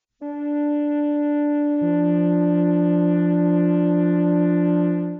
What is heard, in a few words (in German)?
flöte d2 f1; Intervalle, die man durch Potenzen von 2 und 3 darstellen kann